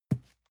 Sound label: cardboard box medium grab soft; objekte